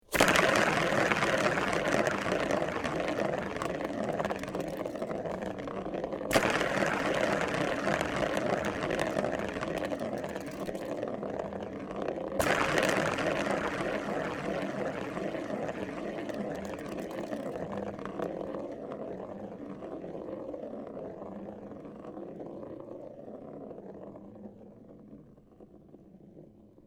Katzenspielzeug

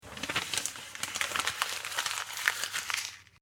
office papier knuellen

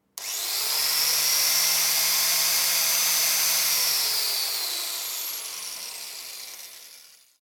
Poliermaschine Leerlauf

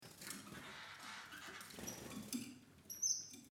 Voegel

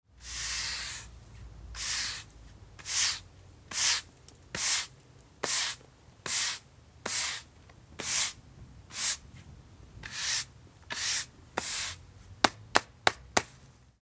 Fegen

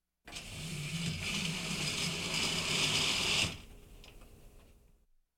Hausarbeiten Heimwerken Vorhaenge zuziehen

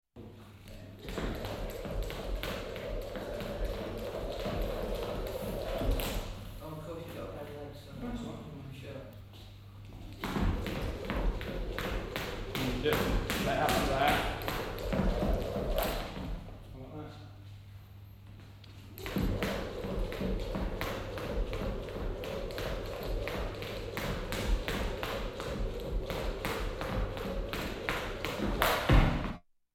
Sport Aufwaermen Mit Seilspringen

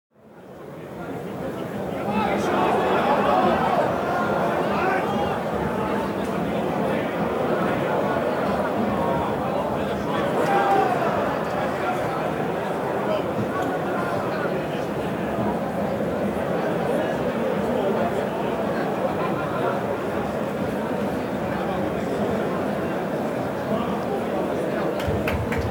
Stadion Fans und Gesaenge

